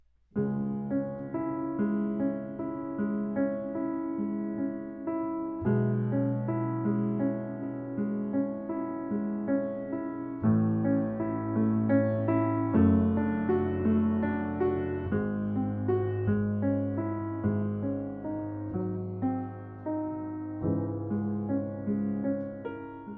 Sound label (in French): Classique